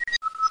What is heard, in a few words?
stereo star trek pager